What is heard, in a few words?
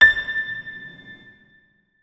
notes, keyboard piano; Hideout Furniture